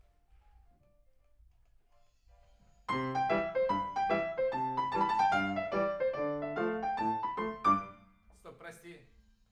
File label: PIANO